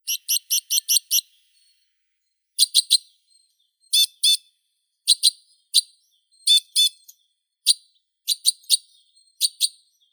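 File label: white-winged crossbill, loxia leucoptera; klest belokrilii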